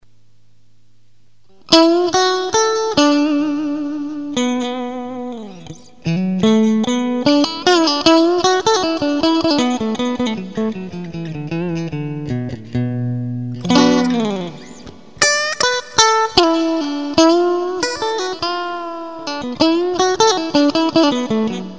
Hybrid Bridge Mid Clean, guitar pickups

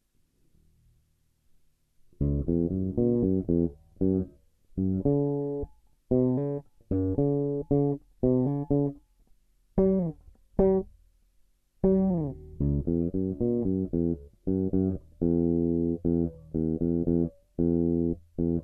Jazz Bass without Drums Treble Down

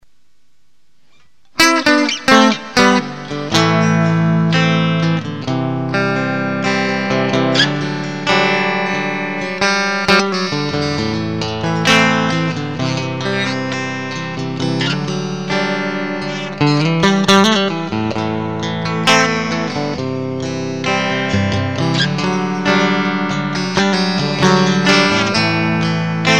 Hybrid Bridge Clean, guitar pickups

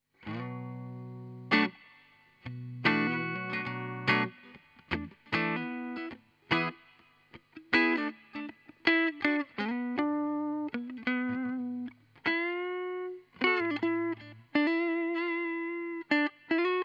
Tele Neck clean

